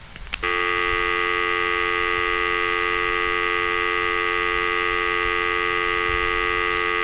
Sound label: dialtone; svencoop; hunger; thambs